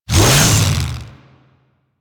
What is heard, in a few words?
Saw Melee Swing; Weapons; Warframe; Grn Ghoul Saw Player